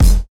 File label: bright hip hop kick drum F# key